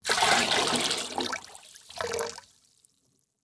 Liquid Water Pouring Into Water In Plastic Bucket Fast Double